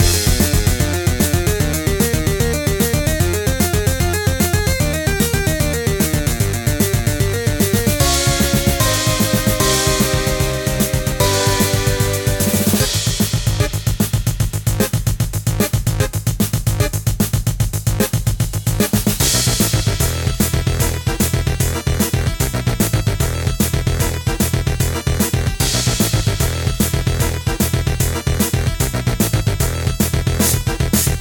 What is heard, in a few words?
Medieval Battle; Flinthook